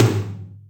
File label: Rock, Acoustic Tom